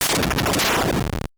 Big Explosion, 8-Bit; Rogue Waves; 8-Bit Legend

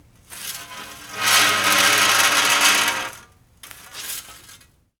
harsh metal; Sound Design Tools; Raw Foley

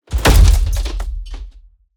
Impacts PROCESSED; SoundBits; Just Impacts

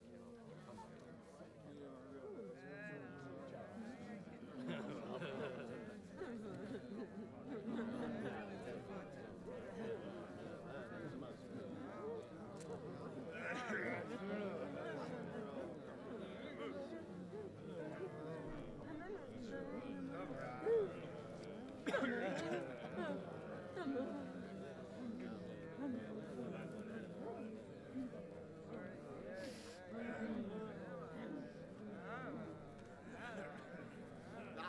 EXT GROUP Unrest Murmur, Battle Crowd; Coll Anderson

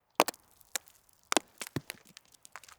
Single Small Rocks Tumble Cliff; Wav Junction Sound Effects

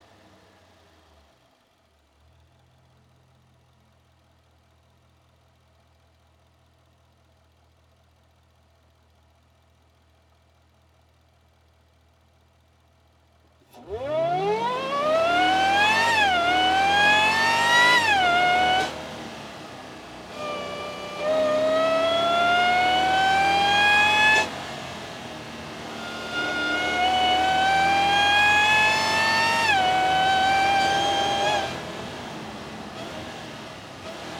Onboards Airbox, Jaguar XE SV Project 8; Pursuit Sound

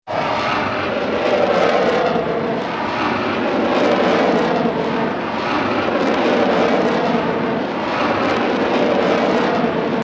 Bullet Time; FXHome; Bullet and Gun

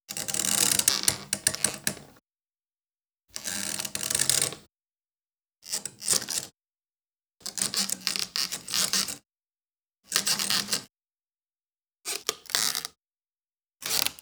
Plastic Creak Bottle

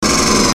M41A Pulse Rifle; FXHome; Bullet and Gun

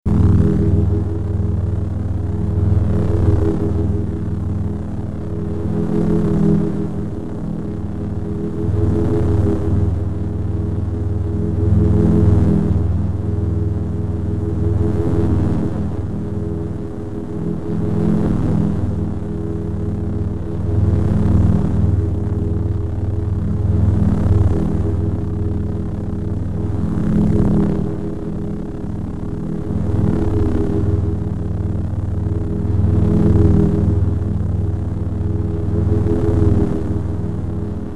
Double Bladed Saber Sound; FXHome

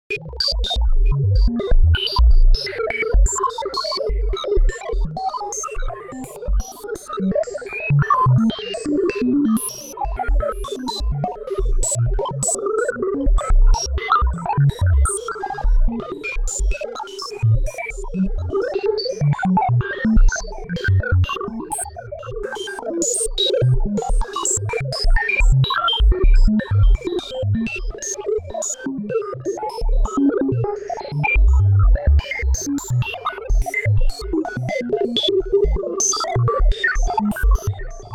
Robot Brain, 70s Sci Fi

